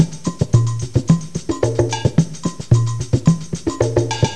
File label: Samba Sounds